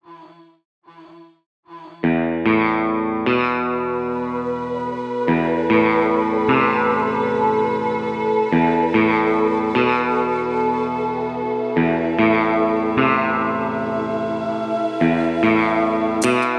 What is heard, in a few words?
eastern distant reveal